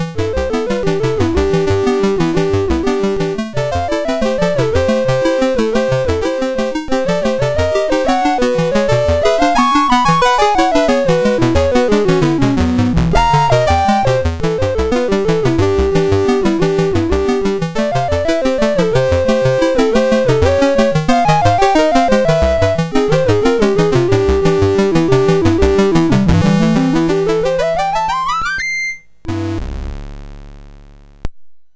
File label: Prelude Vivo E Leggiero, MOS6581R3